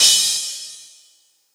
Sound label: large room crash f sharp key, Royality free cymbal crash sound tuned to the F# note